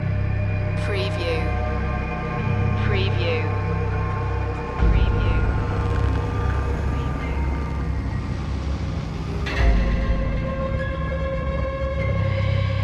looping

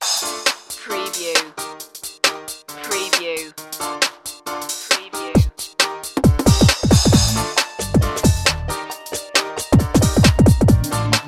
stuffed trumpetos

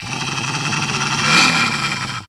dog creature growl

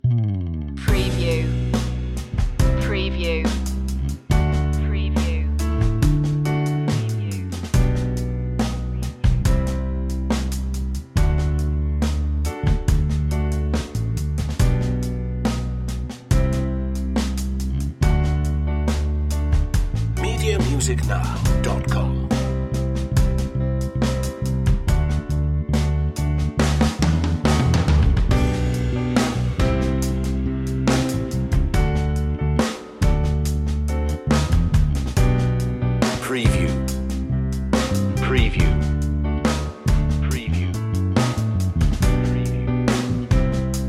underscore piano bass drum

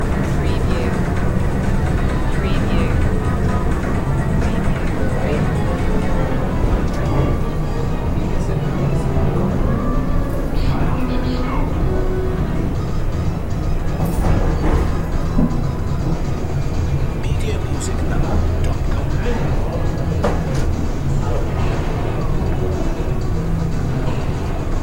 Arcade Empty

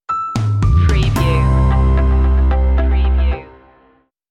happy go lucky stinger

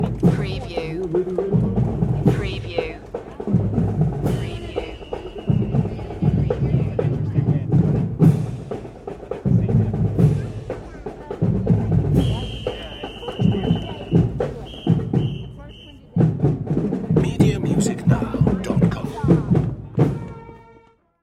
Snare Parade

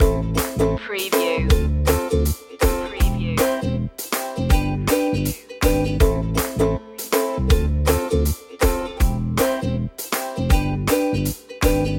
ukulele loop

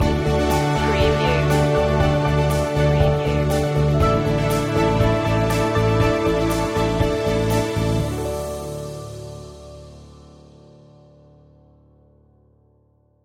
the spirit of being stinger

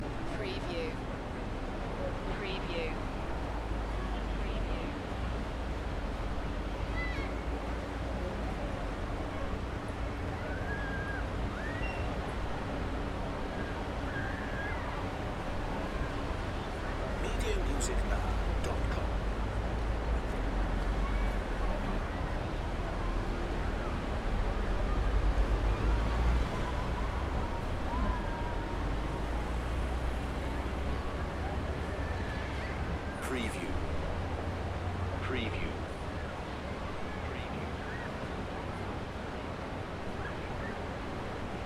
Spanish sea view ambience early evening; beanstalk audio

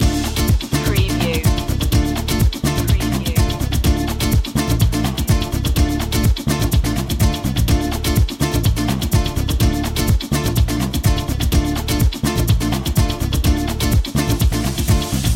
cha cha chase loop

